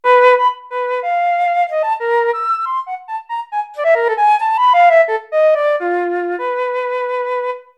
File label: irrational meter flute